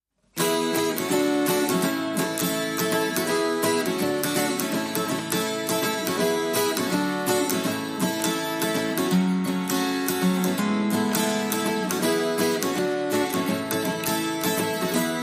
Acoustic Guitars

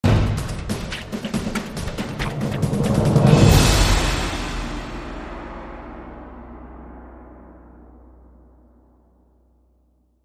Fast Paced Drums Impact

